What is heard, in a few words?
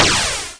Crash Explosion Collide